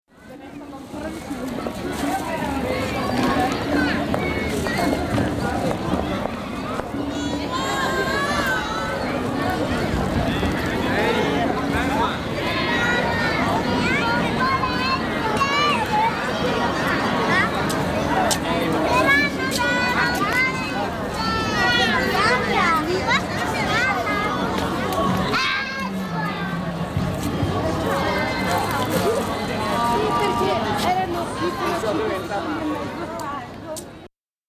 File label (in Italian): suoni campo Santa Magherita